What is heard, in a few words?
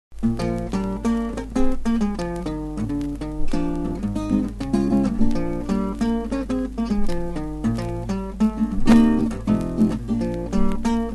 instrumental sample, Folk Blues